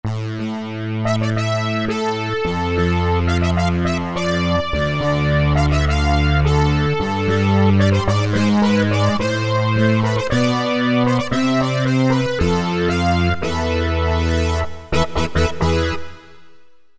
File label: Europe Brass, Roland V-Synth; Factory Patches